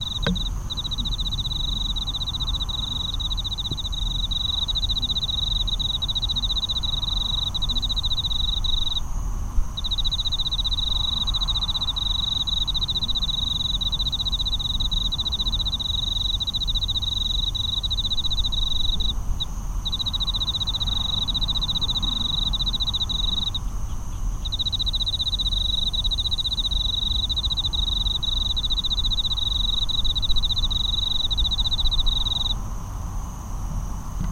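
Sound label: enmakoorogi, cricket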